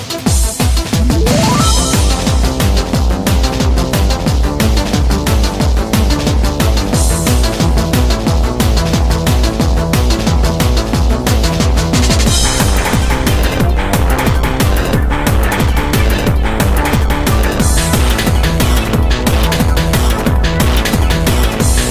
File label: Epic Battle; OnePiece